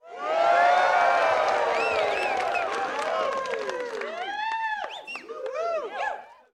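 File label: Crowd 100 Interior With Whoop; medium int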